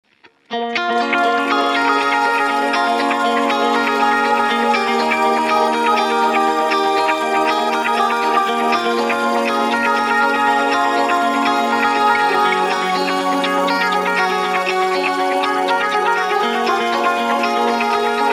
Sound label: Discotheque shimmer